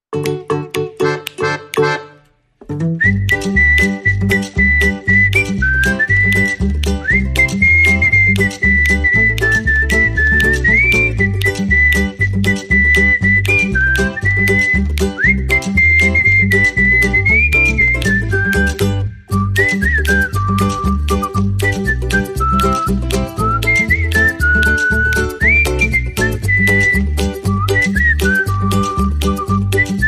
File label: Jingle Bells